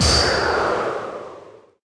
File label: Wind bullet